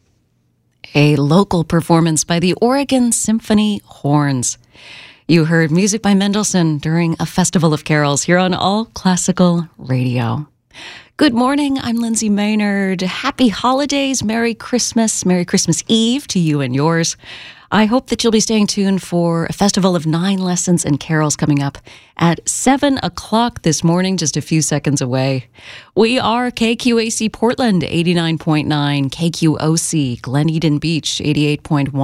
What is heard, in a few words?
brass ensemble; Willan, Healey; Hodie, Christus Natus Est